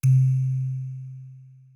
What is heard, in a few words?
dong; DitexManager